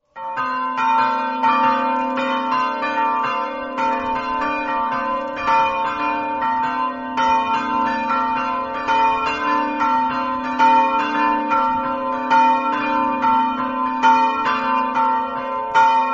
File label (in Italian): firmano, campane